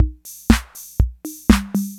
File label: INTROS FILL, DRUMS PERC YAMAHA; Rubber Chicken Software Sample Bank